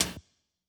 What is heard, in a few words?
Hi Hat